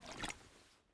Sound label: bota bag slosh; Rust